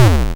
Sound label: YM Kick, DRUMS